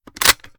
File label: reload empty fast bolt open; gmod